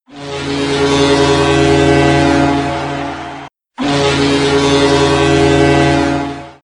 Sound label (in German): klingelton torhymne nebelhorn; sv werder bremen